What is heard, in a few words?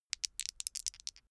dice shake hands open short